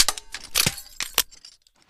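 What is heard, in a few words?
knocking of scattered wheel weights for car wheels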